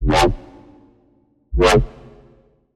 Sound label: Wobble Stamp; Routed Sound; Serum 2 Expansion - Doom Carpenter Vol.1